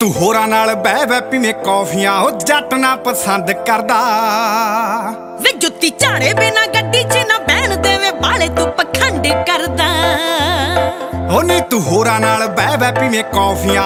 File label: Dance Punjabi Ringtone